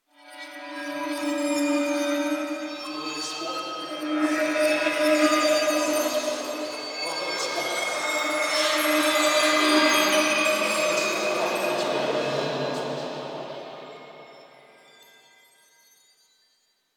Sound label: Chimes Whispers; Warframe; Quests; The New War; Narmer Outpost; Chamber Archon Intro